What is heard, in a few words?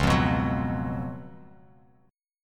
C sharp 11th C sharp; strummed; C#11; JGuitar